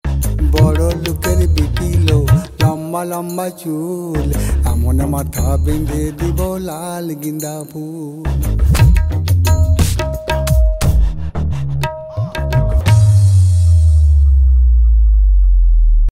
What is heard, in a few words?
Tabla Folk Mix